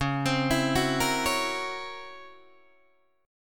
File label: Db Minor Major 13th Db Guitar DADGAD; DbmM13 arpeggiated; JGuitar